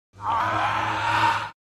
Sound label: Screech Tuned; Palpy